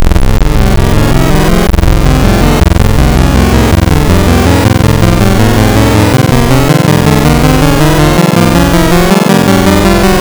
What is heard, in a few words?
Fractal AM, wavetables; Architecture 32